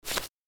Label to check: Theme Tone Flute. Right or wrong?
Wrong